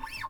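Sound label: B5, classic guitar; Northstar - New Gold VOL-2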